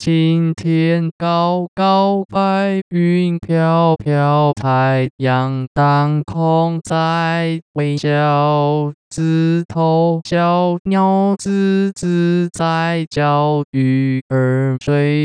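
Synthetic singing voice (male), No coartcltn, Chinese; Mandarin Singing Voice; Ode to Joy; (快樂頌); Pitch Coarticulation for Mandarin Singing Voice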